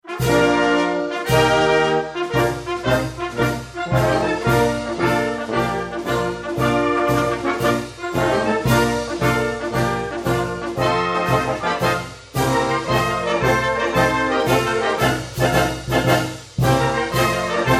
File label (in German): Jubilaeumsmarsch